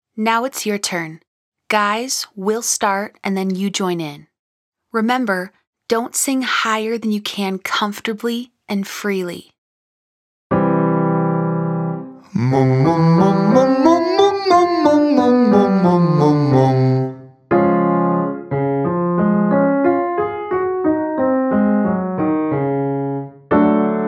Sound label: Moom Moom Moom Long Scale Male Version